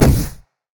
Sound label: great wraith basic attack; LoL; monsters